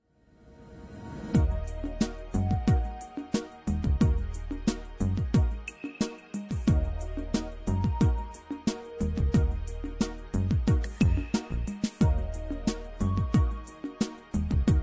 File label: bg loop